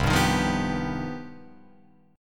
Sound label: C Minor Major 11th, Guitar, Open F; strummed; JGuitar